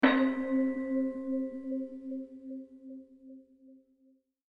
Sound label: Bell One Shot